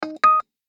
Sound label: Physiotherapy device, بوق; دستگاه فیزیوتراپی